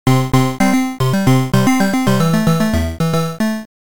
Round Start Music; Dig Dug II; arcade

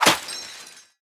Gameplay Wpn Harpoon Hit Armor Metal; Zombie Catchers

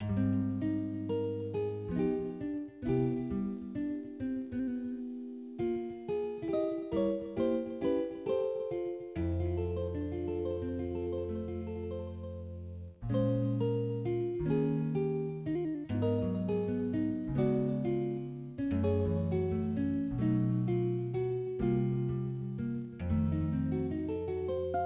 Sounds of Healing; dungeons dragons shadow over mystara; cp system ii arcade

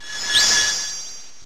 Lucky Chant; PRSFX- Lucky Chant

